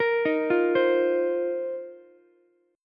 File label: A sharp Suspended 2nd Suspended 4th, Ukulele; arpeggiated; JGuitar; 15,15,13,15